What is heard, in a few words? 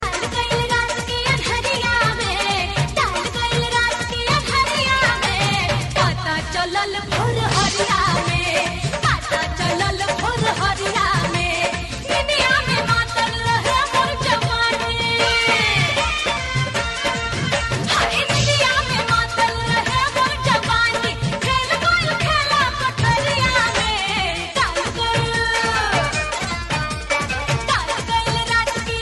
BHOJPURI RINGTONE